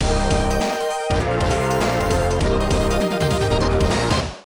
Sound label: Round Clear; Rolling Thunder 2; Namco System 2; arcade